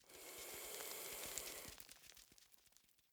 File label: Lizard Scuttle; Devour; The Town; 3D Environment Clip